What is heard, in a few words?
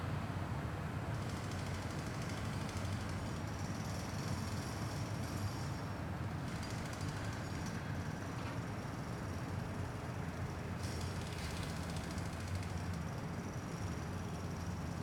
Construction Soundscape